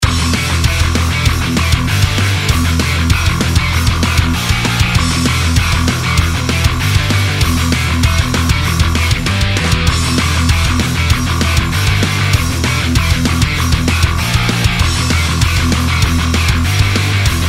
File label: battle